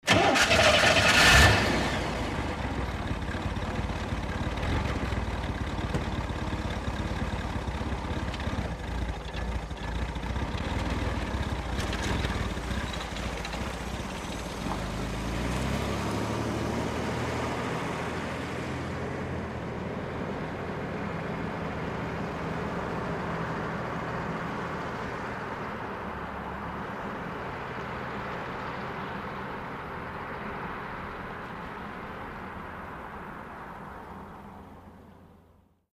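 download Sound, Chevrolet Corvair, Rough Start Close Up, Rough Idle, Away Slow.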